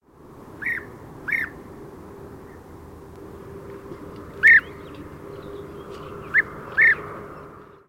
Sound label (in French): guepier d europe